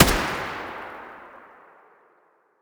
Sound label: ak74 muzzle distant, weapons; STALKER; IWP